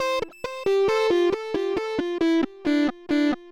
Dubwyzz Keys, Indie Rock

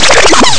wild scratch; ST-XX Amiga Soundtracker Sample Packs

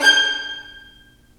STR, Roland String Master Series, Vlns Marc

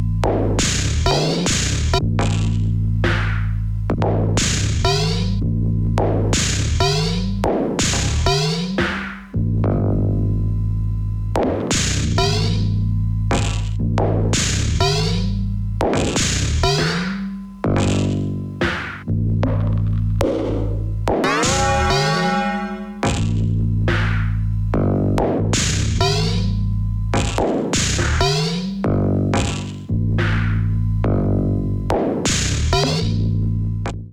texture foundry

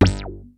HARD BASS; Kid Nepro; Elite

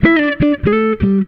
FK SOLO, Jazzistic; FNK

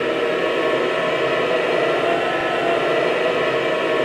AVANTE G, Voices

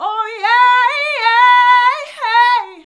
VOX VOX; Sound & Vision - Gigapack II CD 2

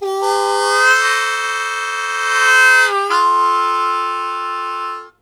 Blues Chord Attack F1, Harmonica; Zero G

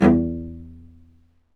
STR, Vc Marcato; Low Marcato; Roland L-CD702; Combos 1

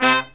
LOW HIT, Brass; USB Soundscan vol.29; Killer Brass Riffs; AKAI

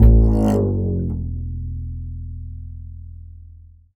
Upright Acoustic Bass; 1ST POSITION; Larry Seyer

